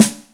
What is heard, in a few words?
Snare L2, Yamaha DTXpress Acoustic Snare